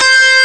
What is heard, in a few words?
lead gui; franetic; Amiga Soundtracker Sample Packs